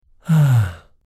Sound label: Man Sigh; Sound FX